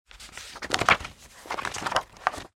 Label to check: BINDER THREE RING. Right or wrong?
Right